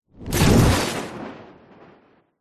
impacts short whoosh into heavy glass and material smash; zapsplat